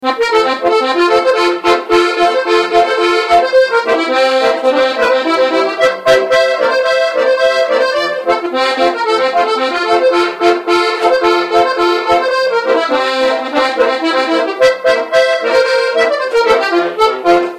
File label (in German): Klarinettenmuckl